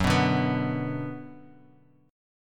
F sharp Suspended 4th Sharp 5th Bass Standard, chord; strummed; JGuitar